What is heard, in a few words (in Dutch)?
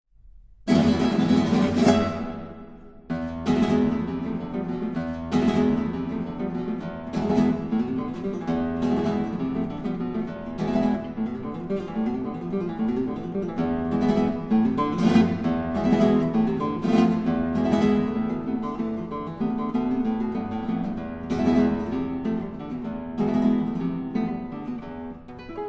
Gitaren akoestisch electrisch en basgitaar